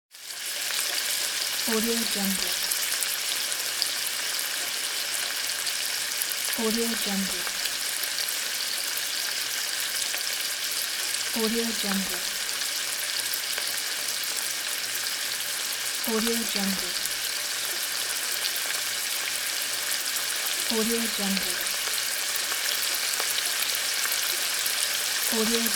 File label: frying food in greasy pan